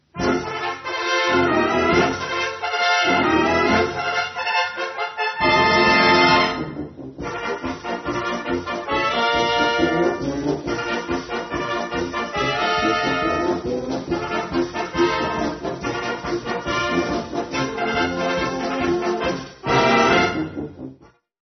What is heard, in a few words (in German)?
Trompeten Parade